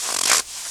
SNOW, FOOTSTEPS; 1000fx

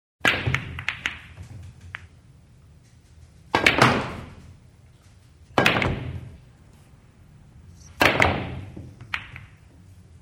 Pool Game Sequence; SOUND EFFECTS1

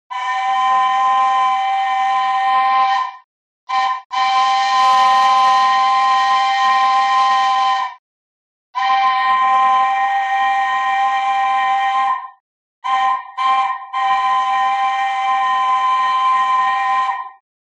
Train Steam Train Whistle Steam Train Whistle; Hollywood Edge 3-D SFx

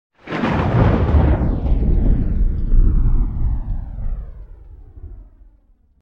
Thunder - Eerie synthesized clap, with flange; Hollywood Edge Sound Designers Tool Kit

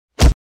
Punch Body Hit With Thud; Fights Bodyfalls

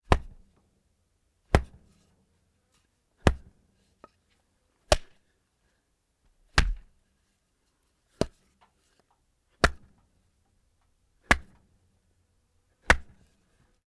Pig Hits Body Impacts with 2x4 Wood; Foley; Foley Essentials; Foley - Misc

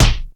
PUNCH, FIGHT; 1000fx

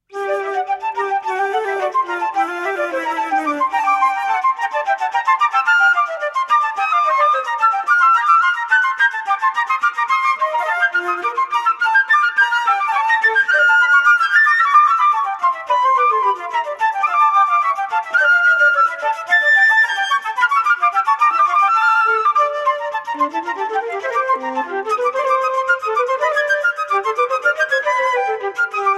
2 part Invention on 2 flutes; Andy Findon; Bach and Telemann; Johann Sebastian Bach

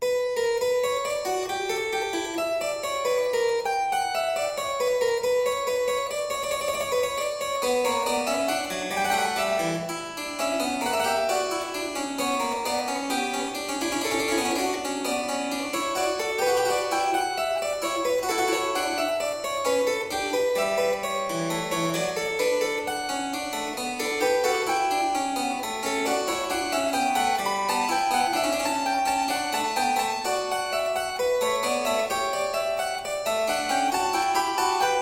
Invention 2 in c minor

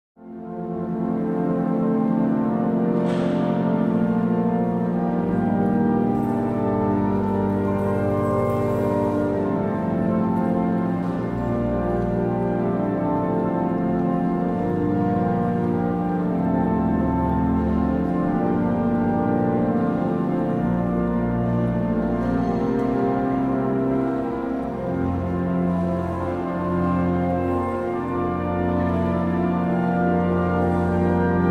Cathedral Organ Plays; Hollywood Edge Historical Series; Traffic Free BG's & FX

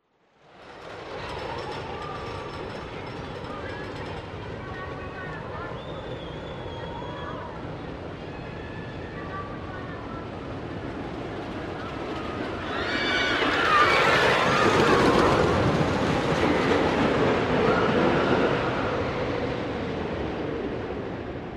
Roller Coaster; SOUND EFFECTS1

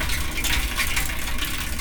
BICYCLE; 1000fx

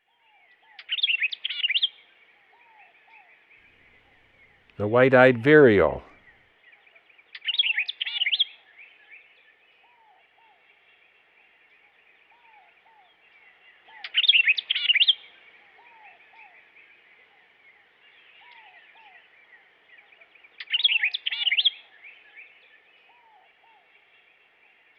White Eyed Vireo; Western North America; Dan Gibson; Wildlife Identification By Sound

